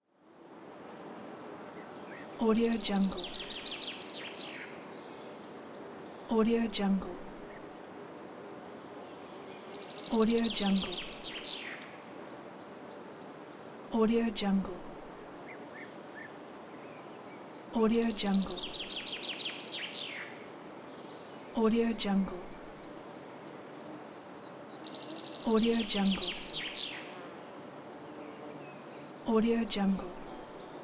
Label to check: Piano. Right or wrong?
Wrong